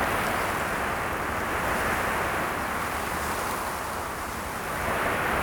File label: Wind Trees, ambient; Totaled; XBOX